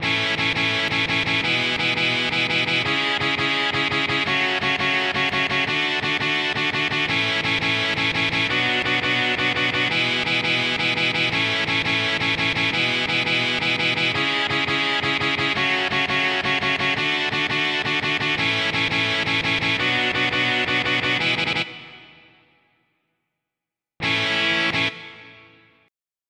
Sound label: guitar